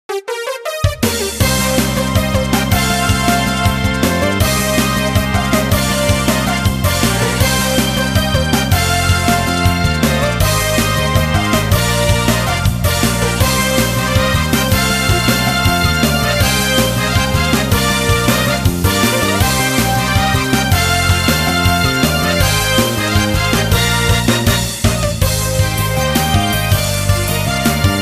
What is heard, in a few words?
Music Menu event